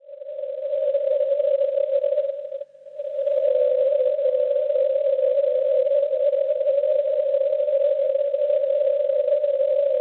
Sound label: NDB Carcassonne Francia 345 kHz; CS; Schwarzsee